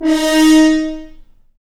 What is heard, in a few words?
BRS F.HRN, FHns Mutes; Roland LCDP06 Brass Sections; F.Horns FX+